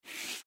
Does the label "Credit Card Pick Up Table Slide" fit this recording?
Yes